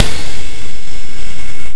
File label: Ride (Eb4); Guitar Rock Tour